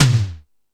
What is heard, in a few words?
Fusion Stix, Drum Machines